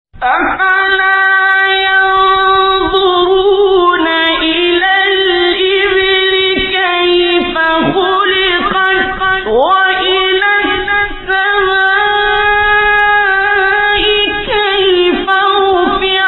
تلاوتی سوره; غاشیه; استاد عبدالباسط

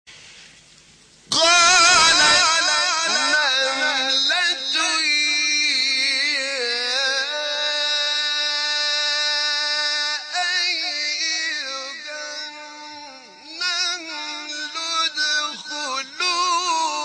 تلاوت آیه سوره نمل; 18; حامد شاکرنژاد

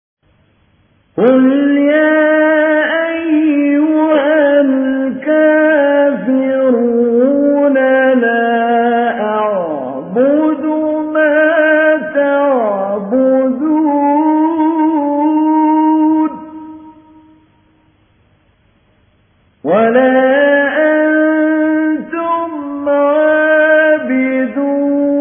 قرائت مجود; محمود علی البنا; سوره کافرون

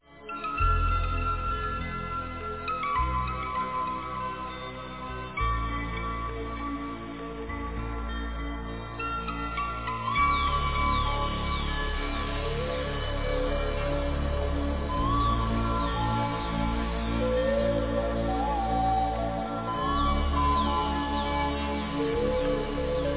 metalik

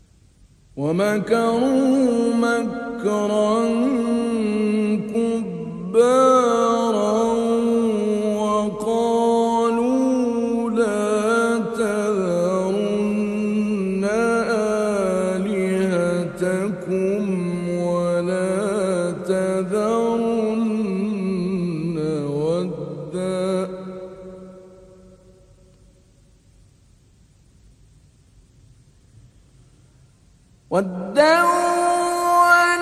تلاوت آیه سوره نوح; آیه 22 24; استاد محمود علی البنا

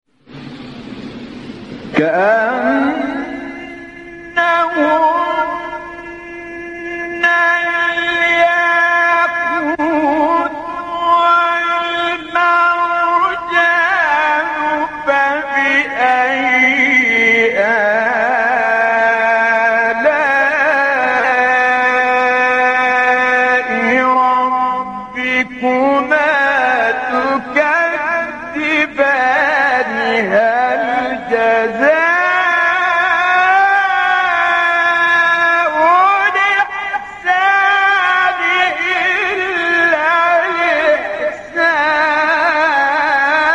تلاوت زیبا از سوره; الرحمن; استاد عبدالعال

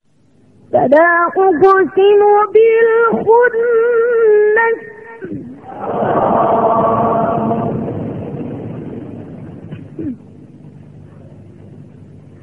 فراز زیبایی از سوره; تکویر

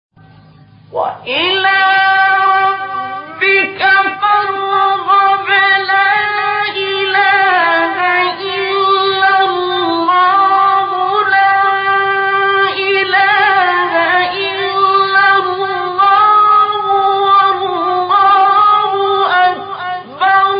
تلاوت دلنشین سوره مبارکه تین; استاد عبدالباسط

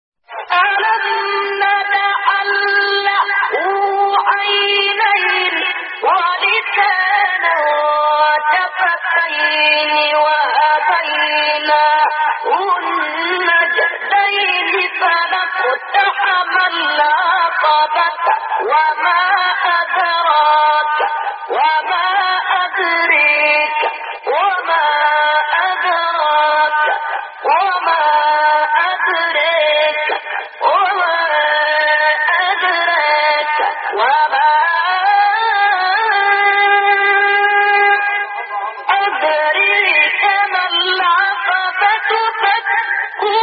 تلاوت زیبای سوره بلد

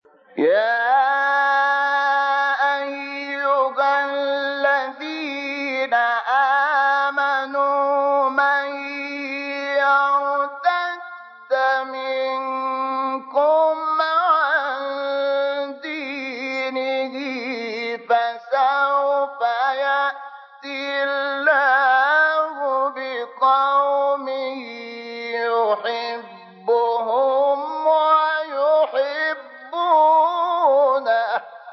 تلاوت مجلسی سوره مائده; سوره مائده آیه 54; شحات محمد انور

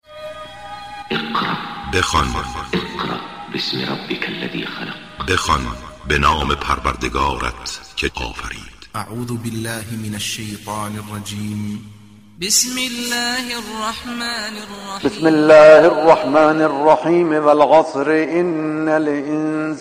تلاوت سوره والعصر

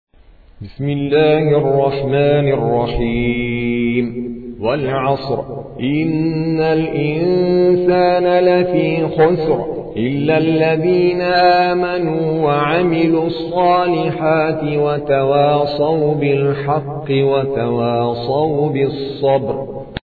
ترتیل قاری هندی; سوره عصر

